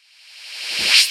Reverse Fx; musicradar

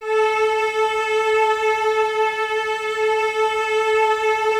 String Ensembles Cellos A 4; Miroslav Vitous; 90 s Sample CDs

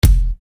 Bass Dr, EuroDance Bdrums

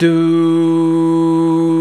VOX, Male Scats; 90s Sample CDs; Club-50 - Foundations Roland

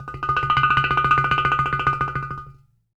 UDU, African Contemporary; Udus; Spectrasonics; Supreme Beats

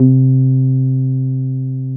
TB-303

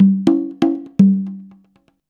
CONGA 119, Modern Percussion Loops

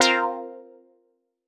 Q Stab C, quart stabs; PowerFX - Nu Jazz House

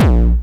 909 HCORE, Drums; Distored Reality 1&2

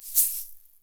SHSOFT, Percussion; Sampleheads; NYC Percussionworks; 90 s Sample CDs

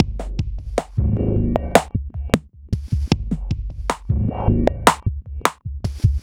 GRUNTOID, LOOPS

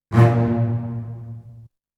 Strings; ZERO G - Datafile DISC 3

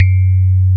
TINE; Wizoo - Powered DX; DIGIJAZZ

